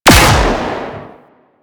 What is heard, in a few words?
Auto Turret Shot; Warframe; Venus Turrets